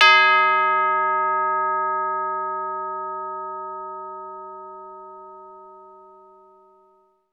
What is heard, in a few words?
PRC CHIME